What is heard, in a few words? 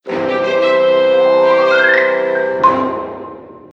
Level Completed; Jim is Moving Out